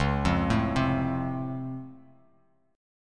Db Augmented Major 7th, down, Bass Standard; arpeggiated; JGuitar

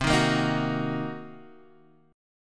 C Minor 7th Flat 5th Guitar Half Step Down, chord

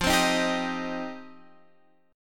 C Minor F; Cm/F strummed; JGuitar

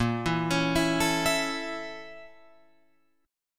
Bb Minor Major 7th Flat 5th, Guitar, Open F; 10,11,13,11,12,11; arpeggiated; chord shape

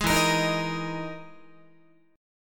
E-Major Sharp 11th E, Guitar; EM#11 strummed

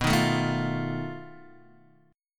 B 7th Flat 5th B Guitar Open D

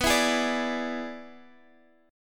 E 7th B, guitar

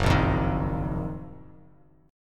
F sharp Major 13th Bass Standard; strummed; JGuitar

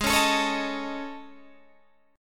A Major Sharp 11th, G sharp

